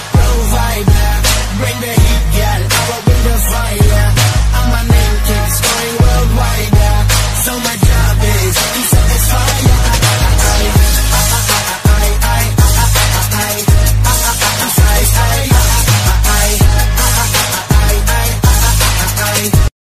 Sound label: english song ringtone; tiktok; english ringtones